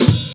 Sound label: Stab